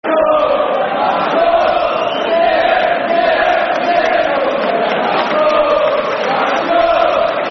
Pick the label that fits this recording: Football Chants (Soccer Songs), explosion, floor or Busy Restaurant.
Football Chants (Soccer Songs)